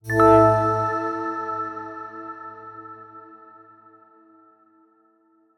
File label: Level Clear